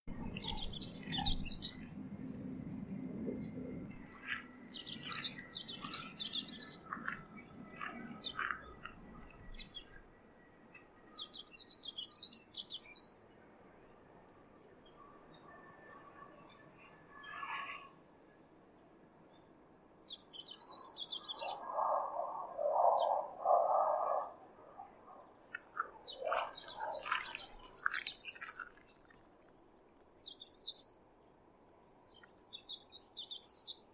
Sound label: Common waxbill